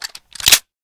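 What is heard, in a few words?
slide release, deagle; cof; guns glock18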